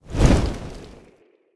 idle flap, phoenix; smite

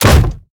melee hit; argus; smite; hera

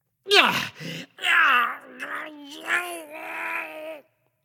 grunt lift; smite; xingtian

